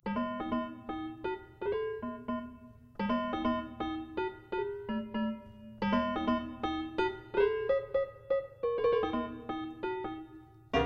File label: jazz; frequency shift